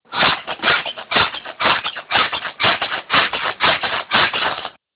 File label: sexy bed